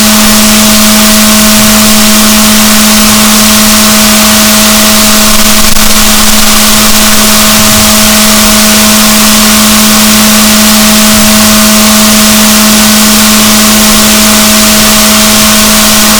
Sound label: rorquals negatif, boat